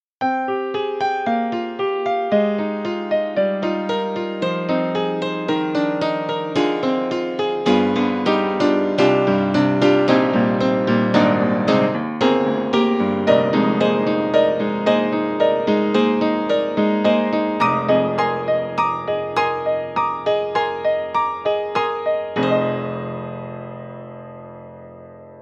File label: Upright Piano Close Saloon Music; Steinberg; The Grand 3